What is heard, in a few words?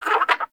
Bird Captured Idle; Hunting Critters; Sawgaw